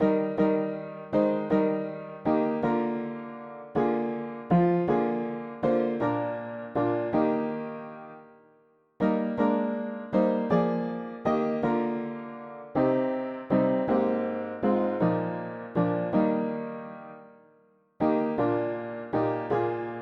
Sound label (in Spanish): mono piano; hymns; Himnario pequeno